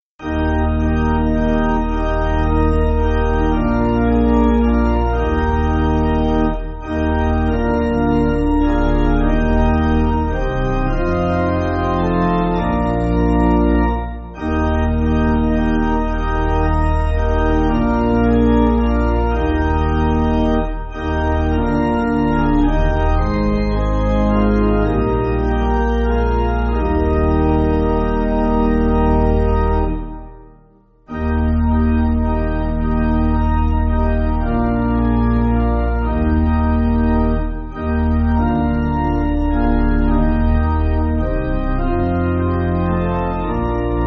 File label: Pipe Organ, Free Music Backing Files for Churches; Lord I Despair; Rosedale 8.8.8.8; Eb